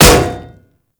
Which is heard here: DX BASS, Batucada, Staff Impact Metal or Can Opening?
Staff Impact Metal